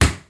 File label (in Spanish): Impacto